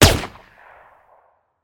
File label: weapon; MP5 (unused)